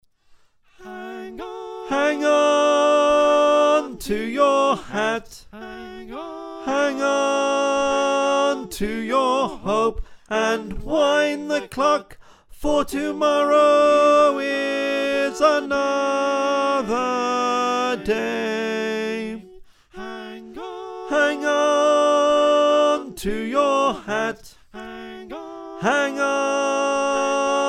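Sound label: Tenor, choir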